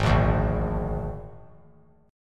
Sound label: G sharp Suspended 2nd Flat 5th G sharp, guitar; strummed; 8,5,5,6; 3C,3F,3A,3D